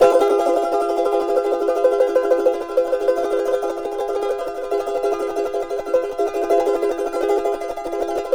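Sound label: charango F# minor tremolo; Spectrasonics; Hans Zimmer Guitars VOL-2; CHARANGO CHD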